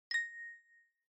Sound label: Smartphone UI Sound Speaker